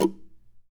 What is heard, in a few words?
NOISE, guitar; Spectrasonics; Hans Zimmer Guitars VOL-2